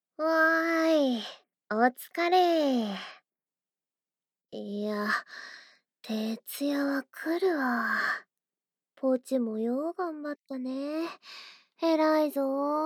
頭を撫でる音; ASMR; わ、何その顔。ご褒美とか欲しい系？

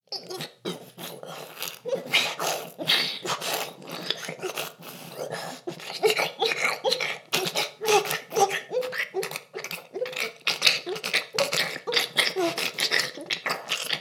フェラ音声; ダメなメイド

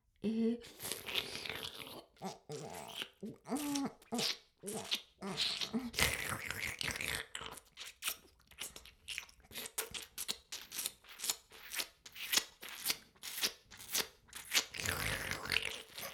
フェラ音声; ASMR; 魔法少女マスカリマッスル

